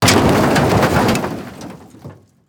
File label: metal object rolling, large metal object crashing and rolling